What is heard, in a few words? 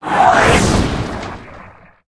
ghoul berserk attack